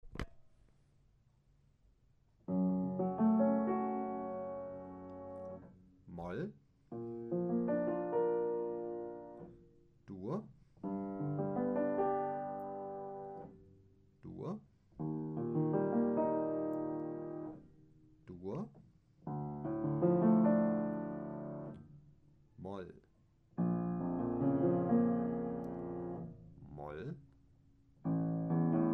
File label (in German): dur moll; gehoerbildung